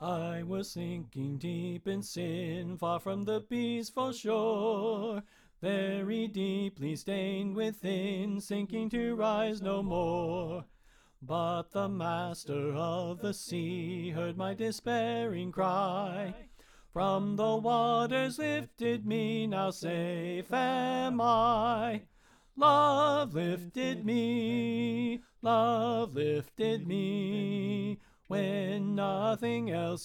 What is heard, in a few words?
Soprano Left